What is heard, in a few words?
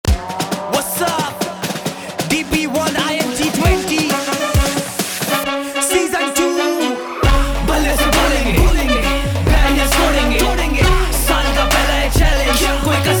Rap Anthem